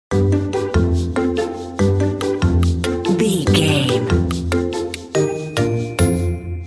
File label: hap hap clappy loop